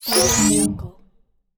futuristic game boost

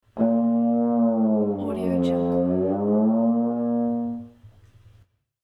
funny cartoon trombone sliding

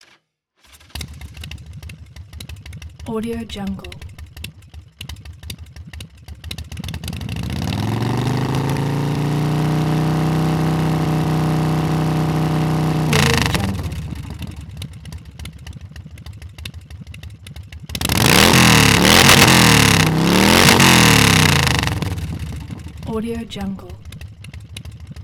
harley bad boy

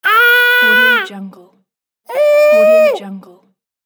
baby cry

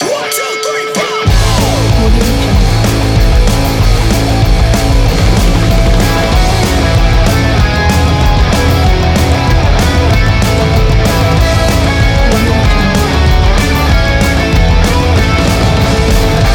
happy birthday punk rock

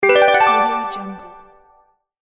elevating levelup bells; monochromeeffect

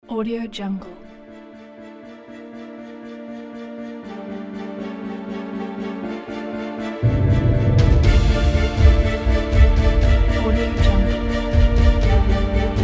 epic inspiration motivational cinematic

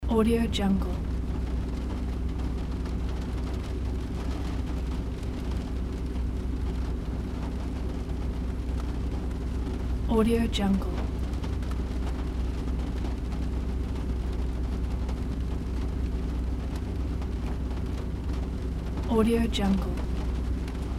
car interior with rain outside ambience

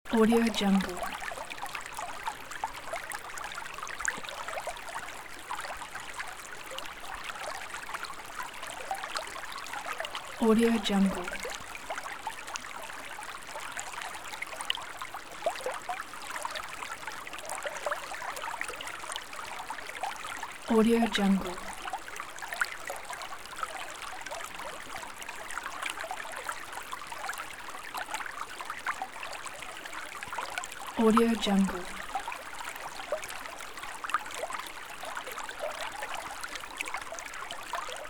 river creek; sviridpavlov

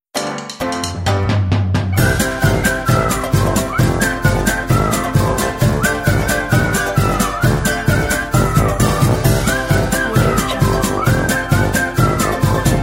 circus

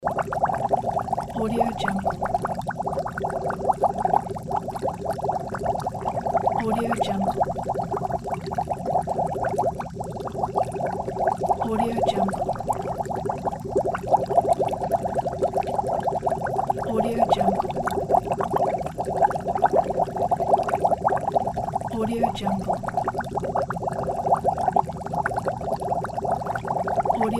boiling; kuksaudio

